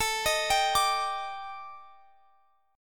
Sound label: Eb Major 7th Flat 5th A, guitar